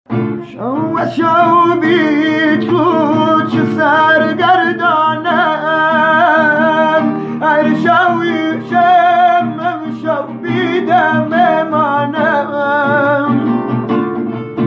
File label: kordi live voice